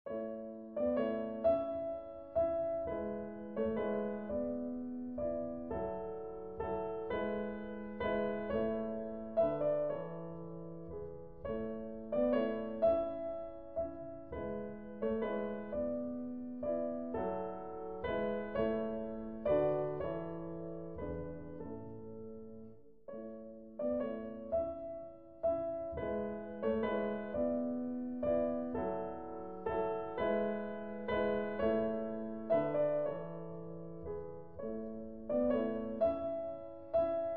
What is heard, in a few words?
Piano Sonata in A Major, Andante grazioso